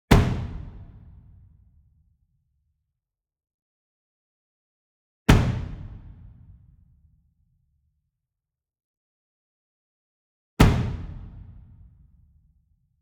SINGLE DRUM Bass Drum Concert 42 Inch Hit Hard Beater Medium; boom library; Cinematic Strikes Construction Kit